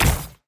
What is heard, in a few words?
heroes kosmyr prismatic shard ripple